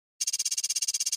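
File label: Computer Read Out High Pitch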